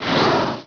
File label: dash